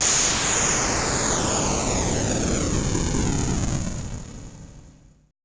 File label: AMBI FX